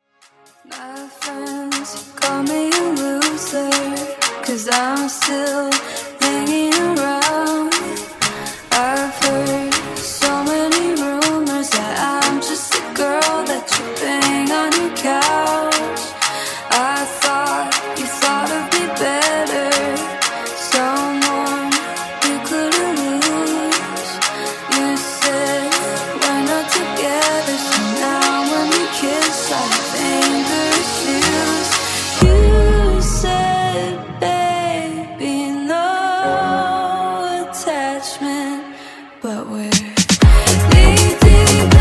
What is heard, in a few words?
Funk Clap Intro 120 Bpm